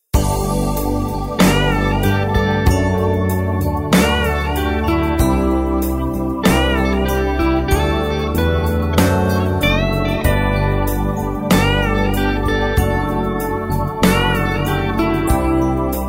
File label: sax